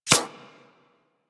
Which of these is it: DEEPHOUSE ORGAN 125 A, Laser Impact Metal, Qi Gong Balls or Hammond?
Laser Impact Metal